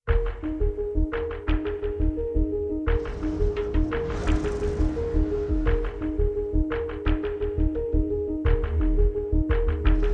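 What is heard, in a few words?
Percussion and Pulses Only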